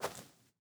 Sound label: footsteps GRASS